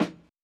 Bricks

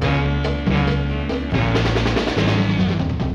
riff break

